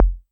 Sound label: Tempest 808 Style Kick, Drum Machines